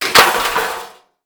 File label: Impact Metal Drop On Concrete Short